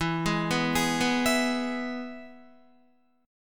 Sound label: E-Major E, Guitar; arpeggiated; JGuitar